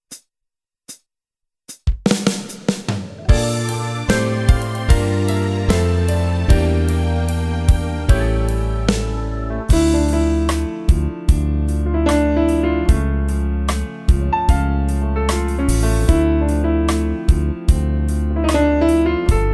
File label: Ballad